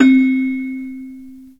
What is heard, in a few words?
C3 CELEST; roland1